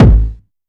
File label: TR909 Kick, Roland SH-32 Drumset